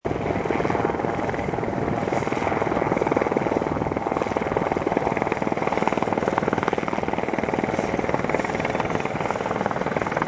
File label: helicopter sound